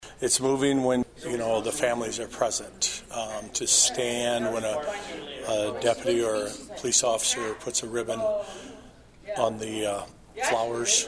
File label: BAGPIPES